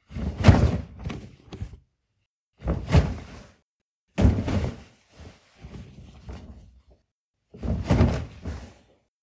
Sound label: Body Fall Heavy Dangerous